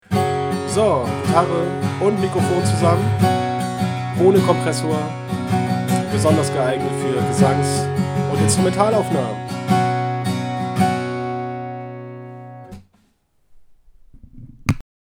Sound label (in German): instr stimme; apogee hypemic